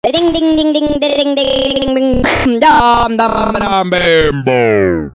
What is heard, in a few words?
crazy glitch frog